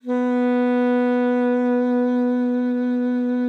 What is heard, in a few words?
B3, alto sax; Xsample VOL-2